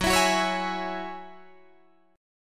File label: F sharp 7th Suspended 2nd, Guitar Drop D